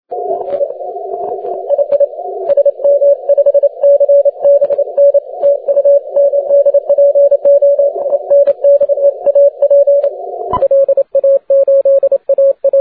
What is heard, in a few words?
30m cw